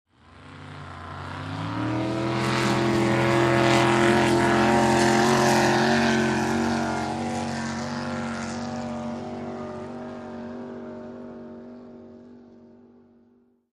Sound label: Drag Boat Start by